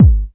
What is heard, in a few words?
raw kick drum single shot g sharp key